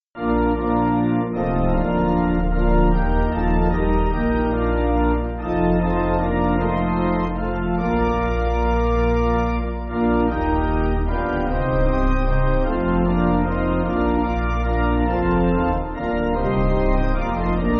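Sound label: Pipe Organ, Backing Files for Churches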